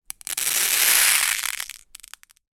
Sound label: leisure toy pin art plastic tilt pins hit glass front slowly; pinpressions